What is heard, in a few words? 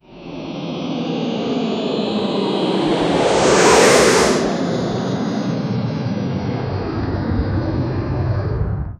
the whoosh of a paper airplane flying past; toys